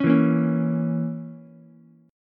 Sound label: A sharp Diminished Ukulele, chord; strummed; JGuitar